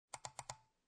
Computer Mouse Double Click, Office, Computer Mouse, Double Click; Electronics; Computers